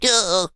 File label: weird effort; Scrat